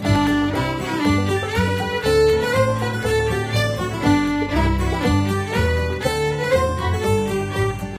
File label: polka; localization